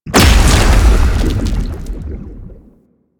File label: Spawn Large Enemy; Warframe; Man In The Wall; Enemy Gameplay